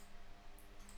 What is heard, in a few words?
vocals